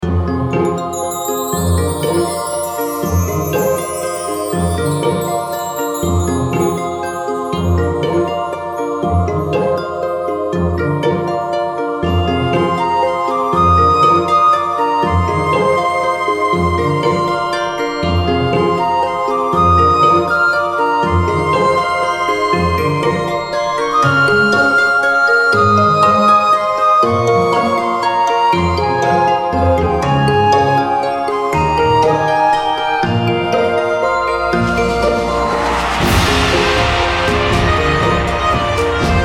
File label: Night loop; PeriTune Material